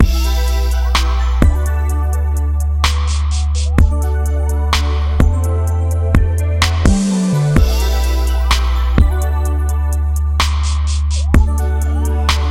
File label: Slow Jam